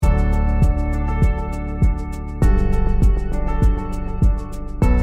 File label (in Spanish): musica de fondo para presentaciones videos corporativos tutoriales